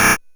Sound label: Drums, Hits; DCM8